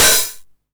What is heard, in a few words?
909 OHH Tape Sat; Goldbaby Tape808 & Tape909; The Tape 909